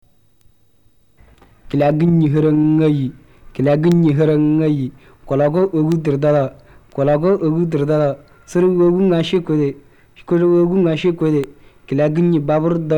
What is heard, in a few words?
tibesti keleli; tchad